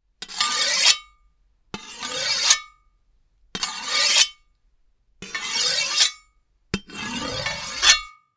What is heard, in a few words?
Sword sharpening metal scraping